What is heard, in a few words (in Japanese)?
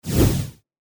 剣を振る; 武器効果音